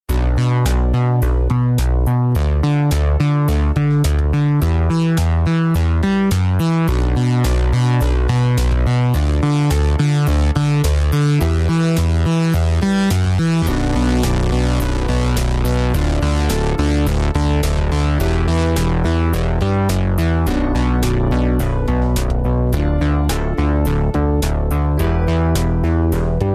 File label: analog filter